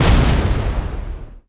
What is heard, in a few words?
sfx skill, ZombieSkill SFX; IdleRPG2024